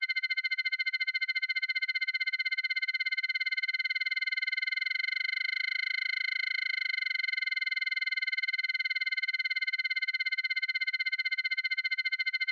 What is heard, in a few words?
robot charge loop; teardown; ps5 windows xbox series xs